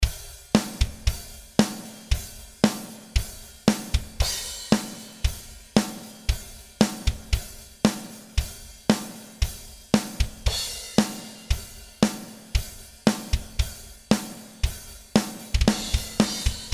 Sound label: LSD Snare; Sneap Style; LSD Drums